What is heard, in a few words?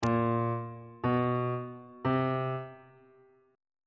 f sharp dim4 perf4 aug4 intervals